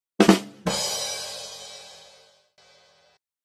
Trommel Trommeln Sound

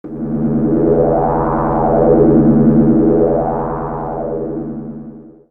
soundeffekte scifi

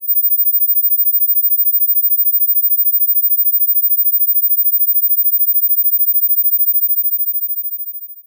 Moskito 15 kHz; moskito klingelton

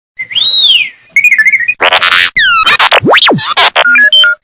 r2 d2 klingelton sound; star wars

